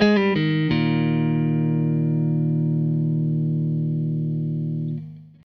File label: Tele Guitar Loop